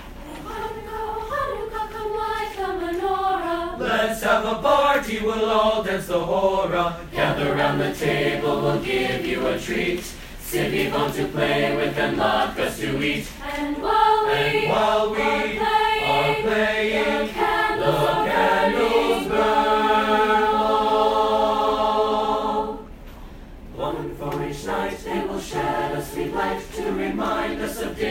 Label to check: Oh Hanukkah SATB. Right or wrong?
Right